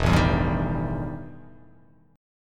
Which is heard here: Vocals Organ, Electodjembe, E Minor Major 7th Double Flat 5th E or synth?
E Minor Major 7th Double Flat 5th E